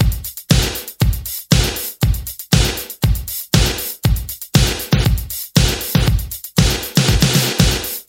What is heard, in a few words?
119 bpm drum groove A key, kick tuned to the A note